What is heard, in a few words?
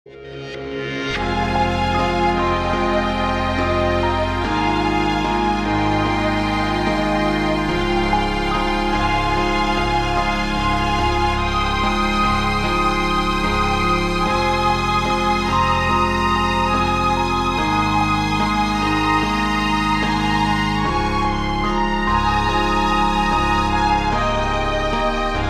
ambient